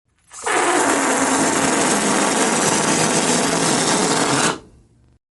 deflating balloon

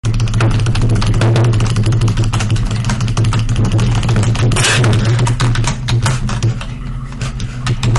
keyboard meme

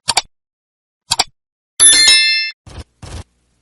noot noot chicken gun

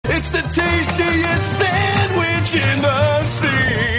skibidi boom

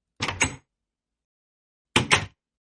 door open door close; minecraft

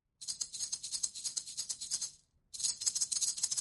shaker sounds

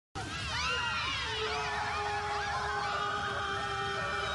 scream; grandpa homers

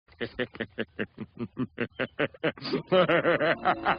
crazy laugh; homers

